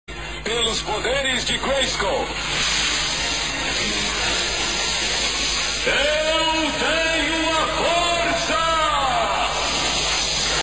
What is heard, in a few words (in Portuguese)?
toque celular; heman